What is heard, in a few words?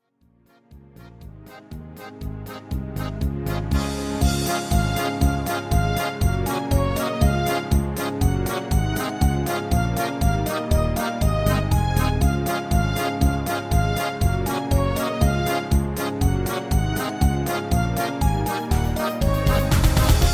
Jingle Bells, Vive le vent instrumental